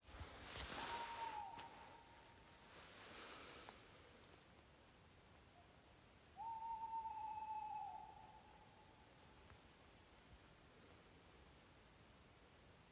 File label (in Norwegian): ugle finnskogen